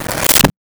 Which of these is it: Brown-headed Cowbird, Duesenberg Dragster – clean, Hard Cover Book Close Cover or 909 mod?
Hard Cover Book Close Cover